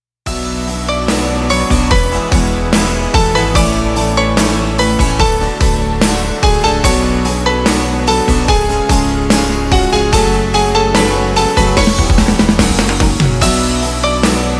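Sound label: beautiful Russian ringtone for phone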